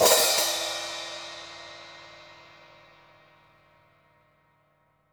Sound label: hihat - opened; JazzFunkKit